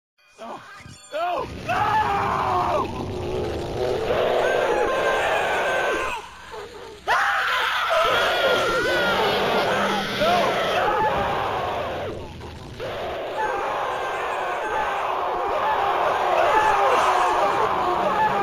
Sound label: screams of the eternal damned